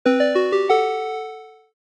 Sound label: Secret Discovered; Phoenotopia Awakening